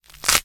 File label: cure find; CosmicRage